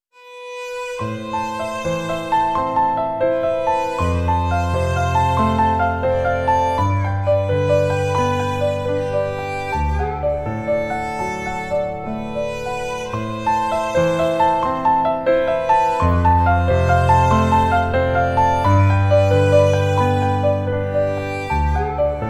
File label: sad violin